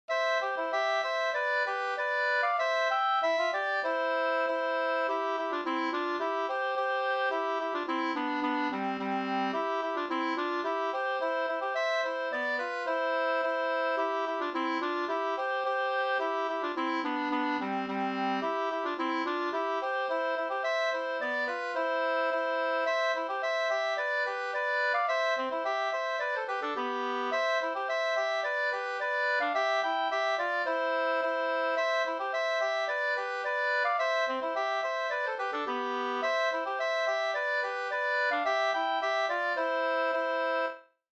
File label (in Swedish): folkdans; Dansa på strå, folkdans för fem par